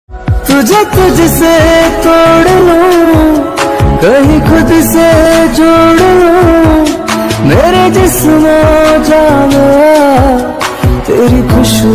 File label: love ringtone, music ringtone